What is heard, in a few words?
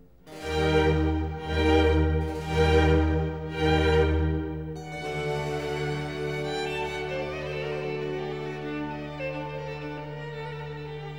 Concerto in F major (4 violins & cello); Vivaldi